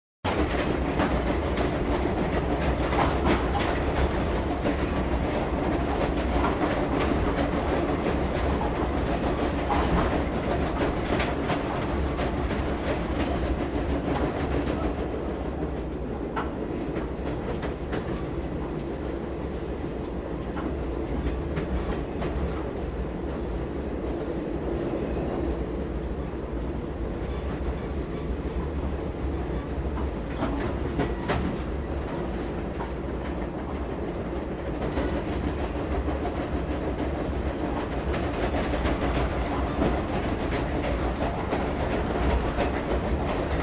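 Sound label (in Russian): шум в тамбуре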